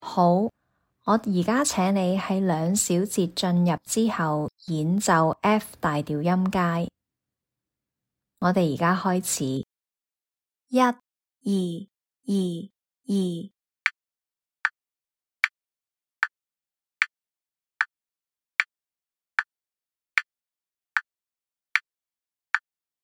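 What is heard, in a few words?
f major scale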